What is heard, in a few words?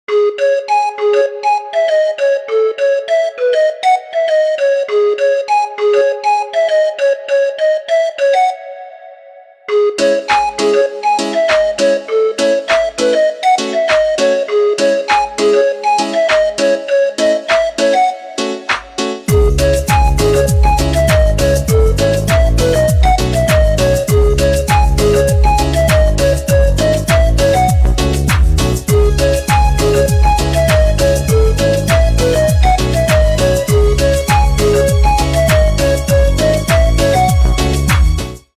Flute Music Ringtone